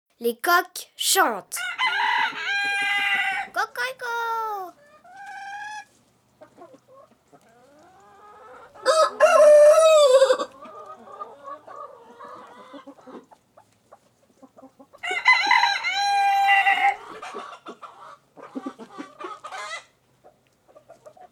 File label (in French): Le coq; mon imagier sonore de la nature